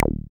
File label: SYN 303, Roland TB-303